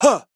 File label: MALE VOICE DEFENSE SHOUT - Defensive Block or Damaged Hurt Submissive Short Interjection; Yell